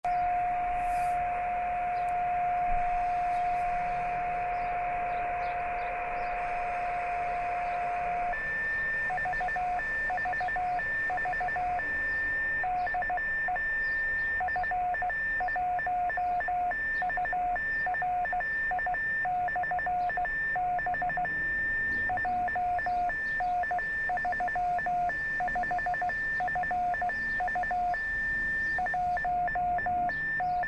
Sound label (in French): balise 10ghz; Mont Blanc